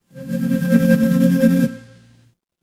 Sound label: office phone vibrate